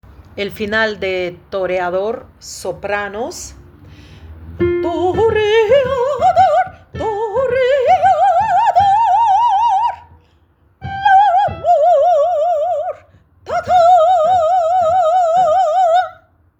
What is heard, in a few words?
Sopranos